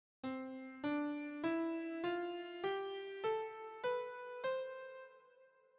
C Maj Scale Degrees

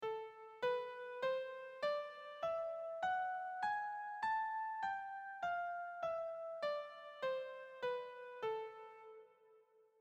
min scale melodic

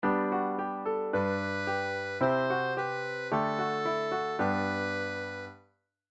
figured bass figuration

